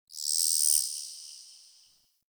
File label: Ext Creature Distant High; Misc Outdoor